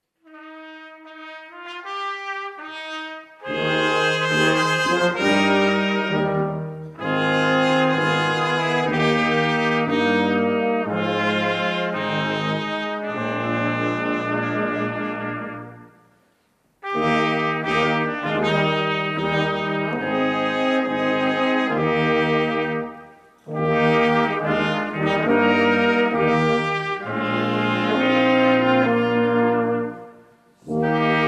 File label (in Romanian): Grup instrumental